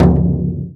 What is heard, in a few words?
BASS, INDIAN PERC; Big Fish Audio - Didgeridoo and Other Primitive Instruments